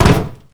metal land

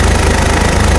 mg loop cave

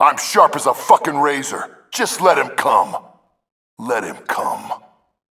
heavy grunt

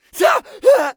scav death, voice; Escape from Tarkov; scav2

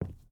footstep boots wood walk; Rust

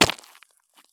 landing gravel; Escape from Tarkov

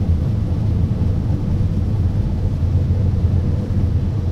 leo2a5 engine int rpm 00 load, tanks; engines; War Thunder

